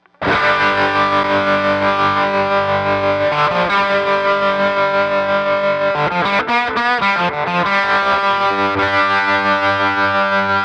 Tremolo Full On choppy rock exploring the pedal's range 335 into 6V6 Princeton; Terrain Tremolo